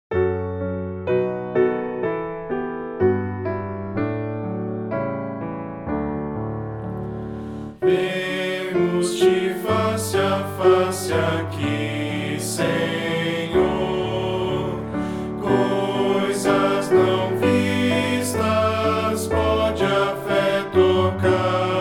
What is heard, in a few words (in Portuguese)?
voz piano